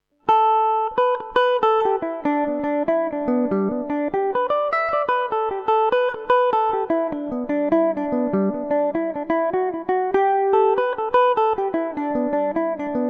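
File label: jig G major revelation, irish mandolin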